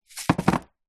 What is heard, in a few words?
food golden gaytime crumbs in metal tin set down table